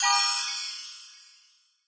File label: pickup gem; GoldMinerJoe